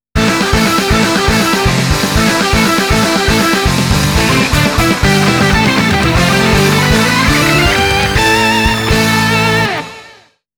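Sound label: Congratulations! (Game Clear); The King of Fighters; iphone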